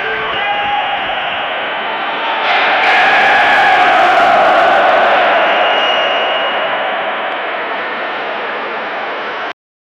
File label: farting on football stadi; stadium